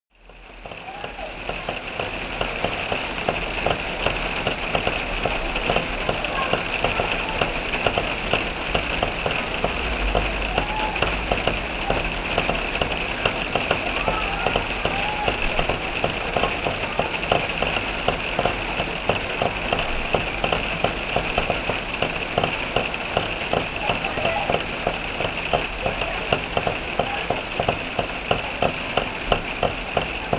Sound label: summer rain